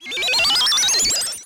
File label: recollection, anime